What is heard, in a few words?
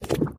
Mud Bouce; doodle jump 2; android